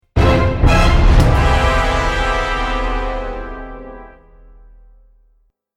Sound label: deathcam